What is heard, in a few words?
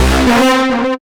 STAB